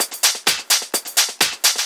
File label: Discohouse Loop 128 BPM